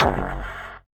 Drums; Distored Reality

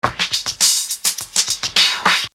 Filter Groove; Construction Kit; HIP-HOP; Sonic Foundry (Sony Creative Software)

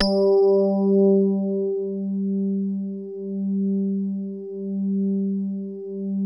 E RHODES; Best Service Advanced Media Trax Modern Composer

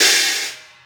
Hi Hat Pattern; Essential Percussion; Hi Hat Patterns

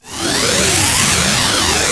NOISE

